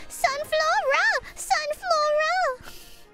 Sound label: Sunflora impression